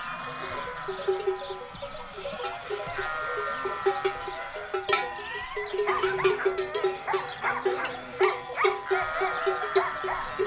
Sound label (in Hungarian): vonuló juhok tanya; Lászitanya